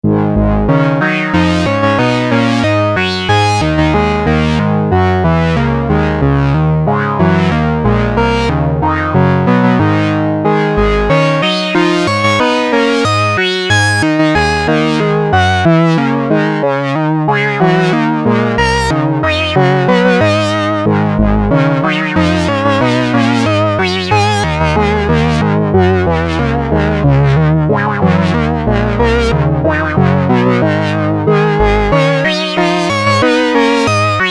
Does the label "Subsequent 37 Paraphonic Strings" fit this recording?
Yes